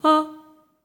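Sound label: staccato D#4, soprano, Voices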